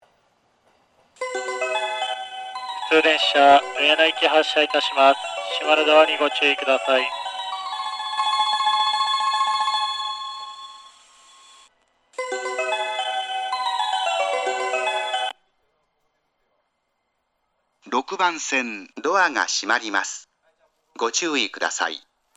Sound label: omiya melody; 1.3コーラスです; 高崎線 浦和・赤羽・上野方面 ■湘南新宿ライン 新宿・大船・小田原方面